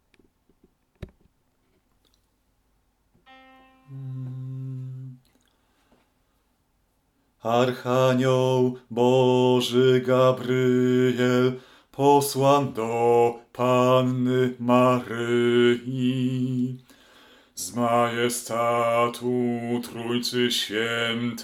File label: Bas; Archaniol Bozy Gabriel; Adwent